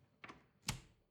refrigerator door close

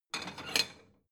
plate small take slide

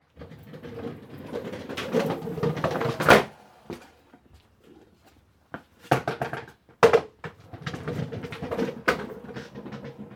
skate board passing

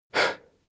male breath fast out

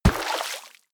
horse step water

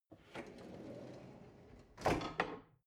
drawer narrow open

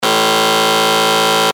game show buzzer

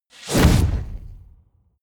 incoming explosion

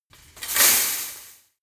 metal impact fence short

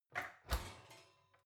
oven open baking sheet ringing soft

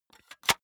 cassette case close medium

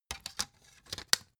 cd in cover hard

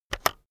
keyboard external enter press fast heavy

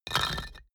stone tile fall

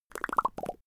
bubbling lips

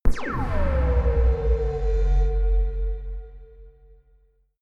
ringing laser beam shot

